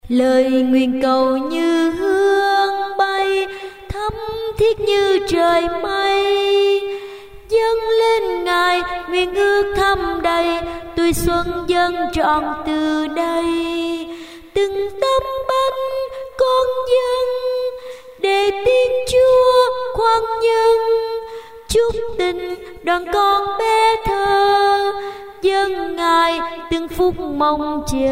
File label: tenor basso